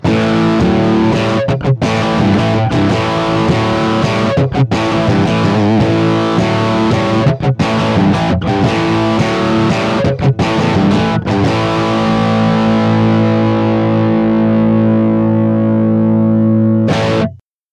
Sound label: bluguitar amp1